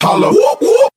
holler whoop whoop; ooh ahh; ho hoo woo

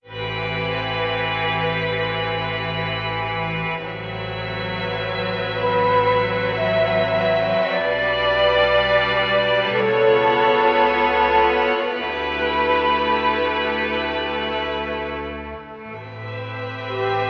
strings and flute, sad loop; loops

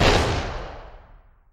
cartoon bang; Crash Bang Boom

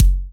Kicks Electro

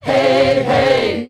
"hey hey" group SUNG, voice; ooh ahh

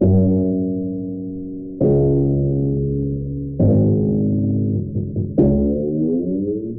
bass, Loops

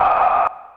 breath

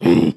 growl, Voice

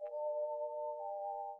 keys, jazz loops; flash gordon

